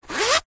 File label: foley zipper clothing or bag single fast movement